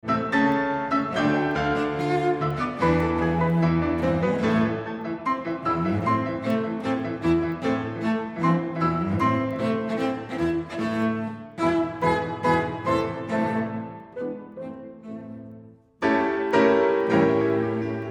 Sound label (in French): sonate piano violoncelle; beethoven